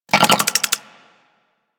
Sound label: Leech Projectile Bite; Leech Osprey